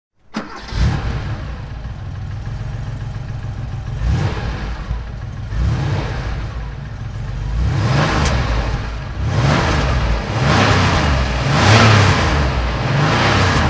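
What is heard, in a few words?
porsche 911 SC 3.0 targa throttle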